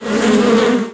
drone; kult

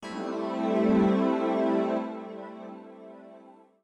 synth house 125 A; eJay; Keys

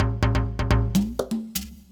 PERCLOOP HOUSE 125; eJay

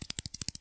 Mole Bomb Walk Loop; worms a

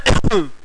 toss; GEOGRAFIA

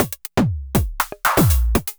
storm loop; maudio; Elektron

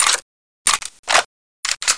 steyr aug reload; Soldat

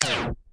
PAUSE SIM; interface

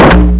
Disc Gun Firing

